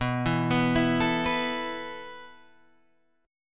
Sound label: B 7th Suspended 4th B